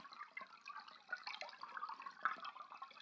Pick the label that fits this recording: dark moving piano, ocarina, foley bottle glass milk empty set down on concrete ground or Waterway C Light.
Waterway C Light